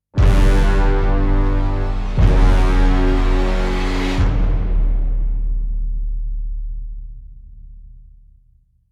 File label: Epic Orchestral Stinger